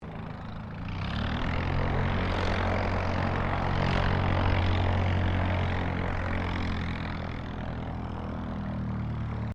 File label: Aircraft Dehavilland Tigermoth Taxi, RAAF Tigermoth biplane training aircraft